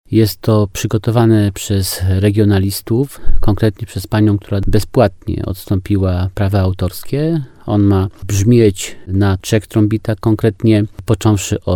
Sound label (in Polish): hejnal